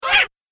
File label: Gremlin Surprised Shriek, monster sound effect